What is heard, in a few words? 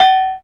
RAW BELL, perc; Zero-G; Funky Elements